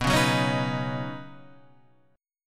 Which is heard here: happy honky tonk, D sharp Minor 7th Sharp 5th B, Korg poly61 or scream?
D sharp Minor 7th Sharp 5th B